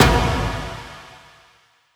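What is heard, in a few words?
Perc Serious Impact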